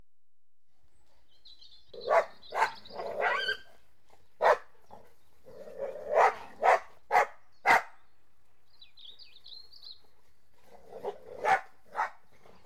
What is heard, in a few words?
Border Collies And Labs Playing A; Horses & Dogs; BBC Sound Effects Library